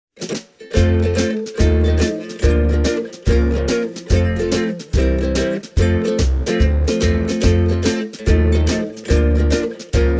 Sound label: flight gut350sp